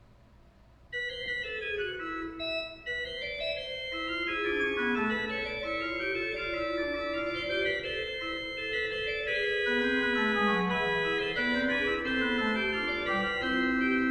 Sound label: Fugue, Organ; Magnificat quarti toni; Johann Pachelbel; Complete Organ Works